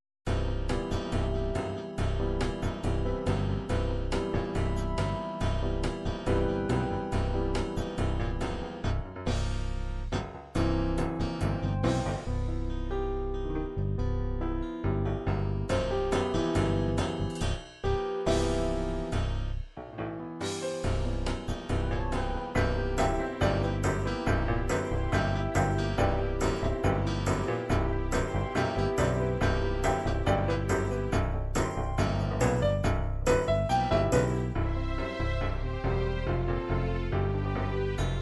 rock, midi

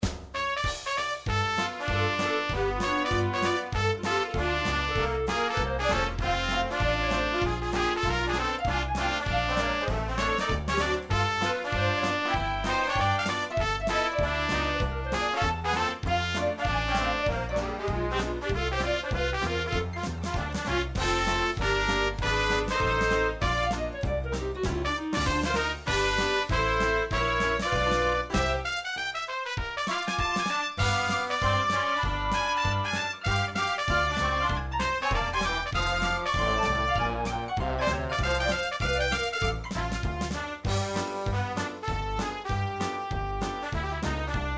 oldies stomp, midi

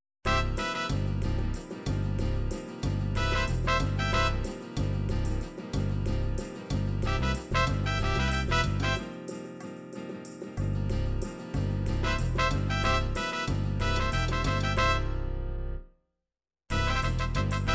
latin flamenco

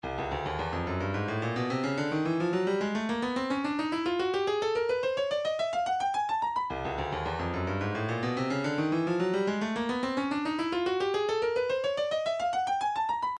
indiatek sitar, midi

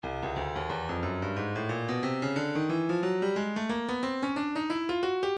breakbeat 090 bpm; Stylus; Pushy

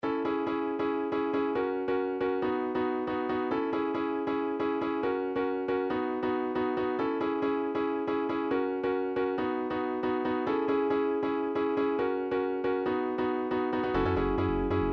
trance, midi

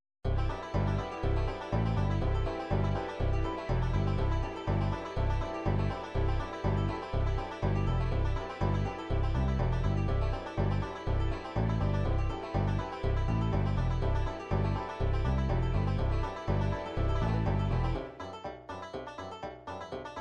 bluegrass, midi

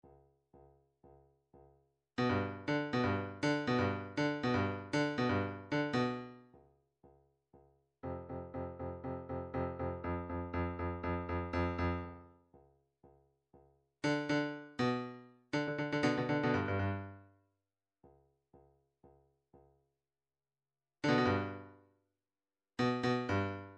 drum trax tom

